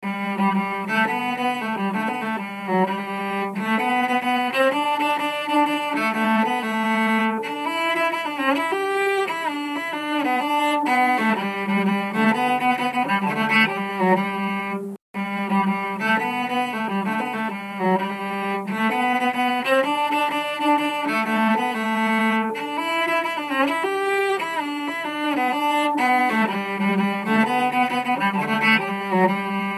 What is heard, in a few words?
Classical Wreck